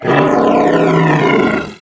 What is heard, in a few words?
sight, doom3 pinky